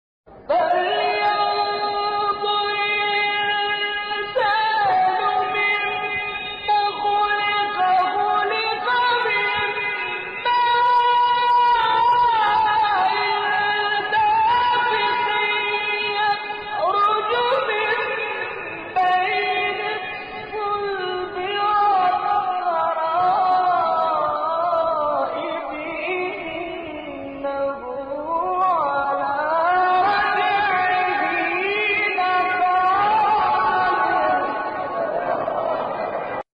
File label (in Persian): تلاوت زیبای سوره; شعبان عبدالعزیز صیاد